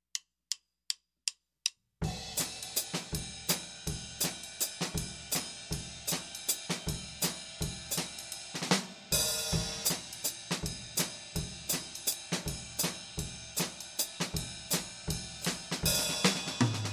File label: Oddtime Jazz 5-4 160 BPM